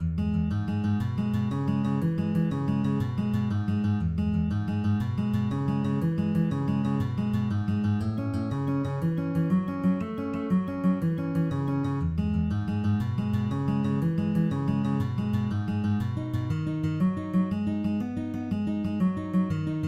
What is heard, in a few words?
Shuffle Picking